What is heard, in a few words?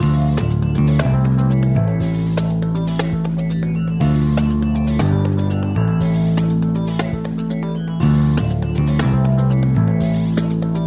little 80s progression